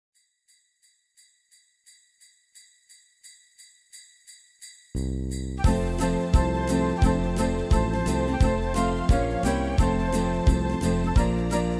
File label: Jingle Bells